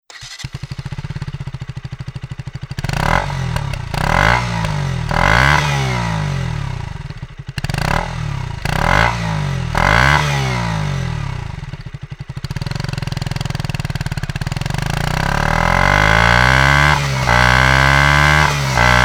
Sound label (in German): 390 Duke Sound REMUS MESH Schalldaempfer; EURO 5; 125 390 Duke